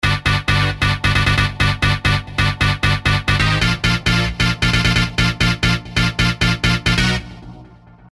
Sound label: G (134BPM)